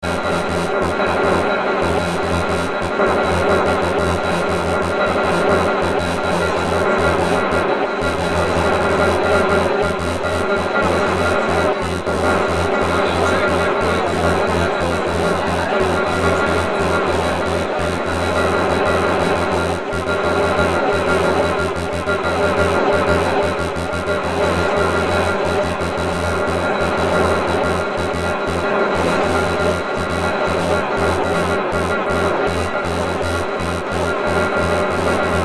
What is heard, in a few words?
Drum